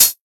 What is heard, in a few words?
natural hi hat C# key, Royality free hi hat closed sound tuned to the C# note; hits one shots; hat samples; C sharp